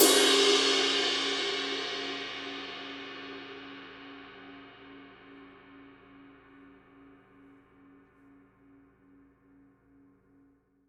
signature dark energy 22 dark energy ride mark ii edge